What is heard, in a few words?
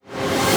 Misc Short FX